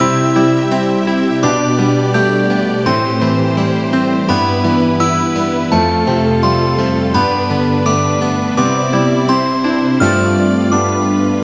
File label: BGM Test; puzzling masterpiece exploration 2009 nds gamerip